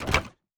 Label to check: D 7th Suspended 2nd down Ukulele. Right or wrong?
Wrong